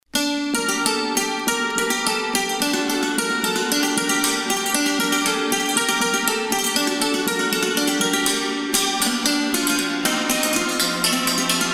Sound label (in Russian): donskaja polka cimbaly